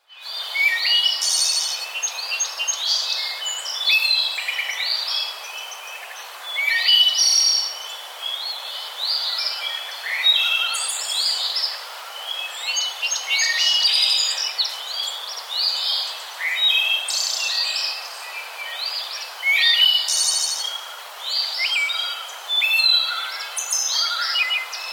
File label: Birds